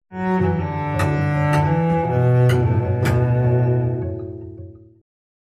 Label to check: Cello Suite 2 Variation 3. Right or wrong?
Right